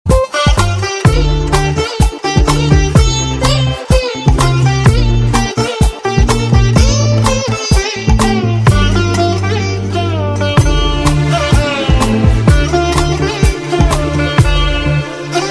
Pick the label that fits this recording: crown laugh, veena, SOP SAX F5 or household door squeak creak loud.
veena